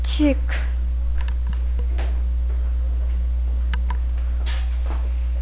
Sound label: chick